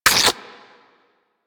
Inf Punch Life Steal B; Warframe; Weapons; Infested; Inf Kick Punch

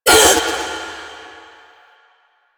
Ring Fail; Warframe; Jade Shadows; Quests; Birth Minigame

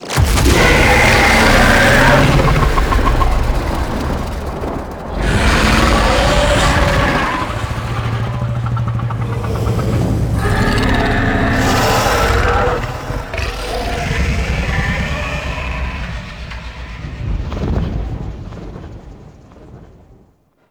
Infested Micro Planet Moon Monster Hit; Warframe; Microplanet Sun Monster

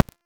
Revenant Shotgun Alt Proj Explosion; Warframe; Tenno

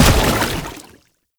Step Water, Footsteps

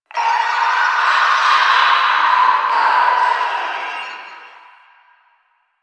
Exterior Foreground Infested; Warframe; Ambience; Nineteen Ninety Nine

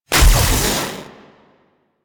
Gun Shot; Warframe; Thano Tech Arch Gun

